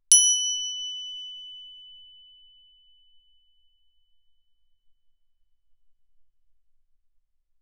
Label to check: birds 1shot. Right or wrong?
Wrong